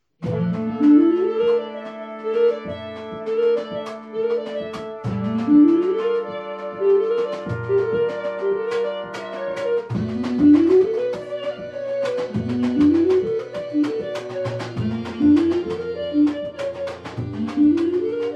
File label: shuffle E, rehearsal